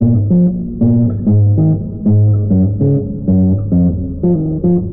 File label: bass grounded, Loops, Phonky